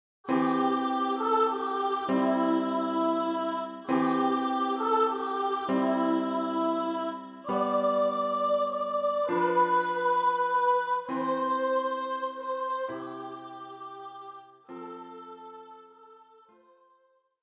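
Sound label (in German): Hohe Stimme; Silent Night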